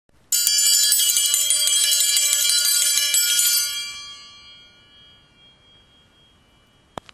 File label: triangl tremolando